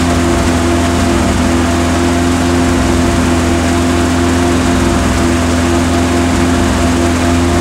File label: m4a3 engine rpm 99 load, tanks; War Thunder